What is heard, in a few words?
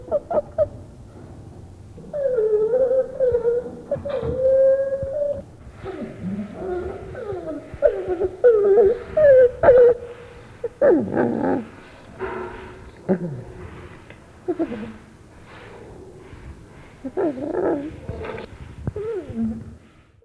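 gorilla, ニシローランドゴリラ 鳴き声; 咳に似た声、げっぷに似た声、じゃれているときの声、ドラミング（胸をたたく発音法）の前の声、食事をしているときのハミングのような声、威嚇の声など、さまざまな声を出します。; 多摩動物公園; ニシゴリラ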